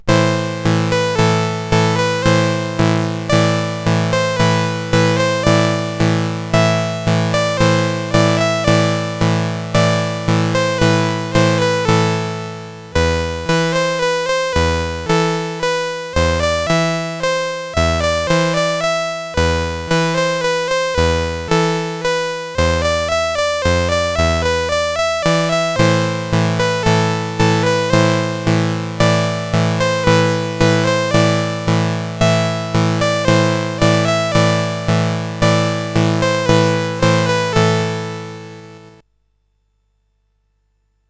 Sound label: sid, CSG8580R5; Chief Tomahawks Games